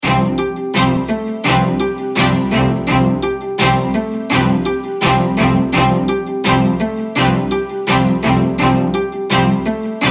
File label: Bgm Fantasy; rpg maker mv